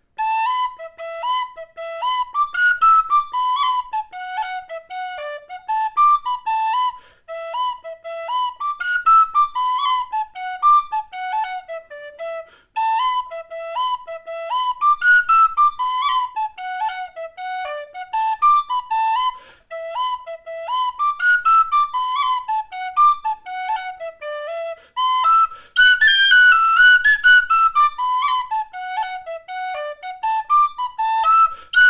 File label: Killavil Jig, whistle